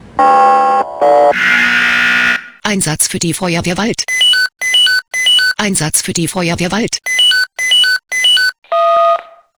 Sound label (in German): SMS Alarmierungstone; FW Wald